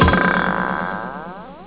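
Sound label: glass on stone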